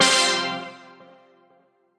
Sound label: Synthesizer Middle C Notes, Lead